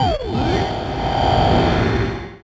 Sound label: FX Dance